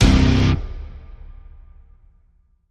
Stab Guitar Single (C)

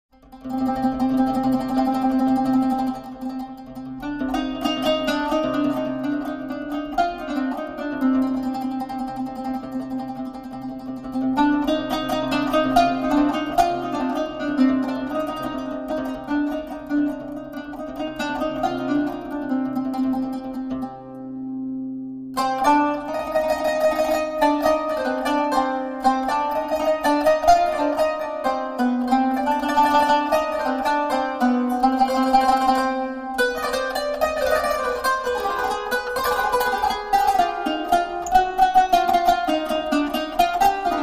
Qanun Taqsiim

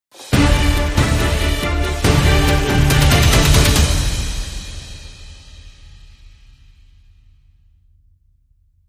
Dramatic Sting, Short

